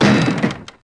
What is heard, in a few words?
Door dam